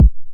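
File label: 909 BD tape, kick drum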